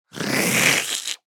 Animal creature monster hiss

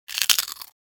Bite crisp crunch single

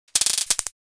Taser buzz electrical clicking stun gun

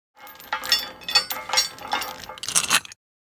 Bicycle chain lock locking

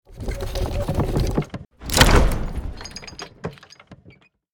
Catapult charging and shooting medieval

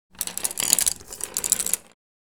Paint brushes handling and movements

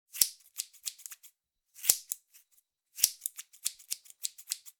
Cutting hair scissors snapping

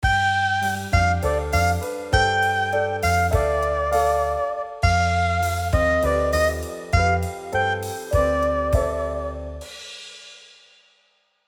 Romantic scene sexy love sitcom music

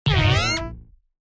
Puzzle strategy game menu ui error

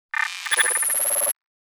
Digital hologram interface data searching

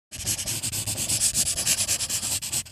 Pencil scribbling on paper

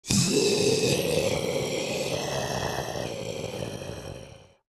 Zombie idle groan

